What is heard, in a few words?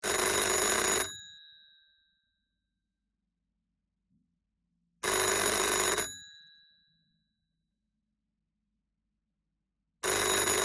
antique wooden phone european standard ringing; 1930; sound ex machina